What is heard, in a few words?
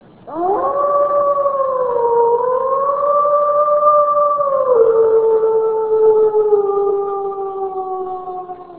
Howl